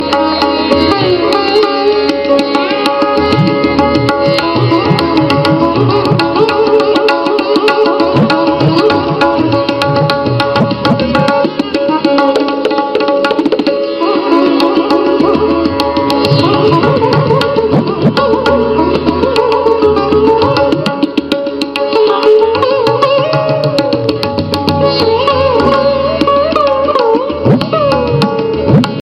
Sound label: sitar tabla violin ringtone; bollywood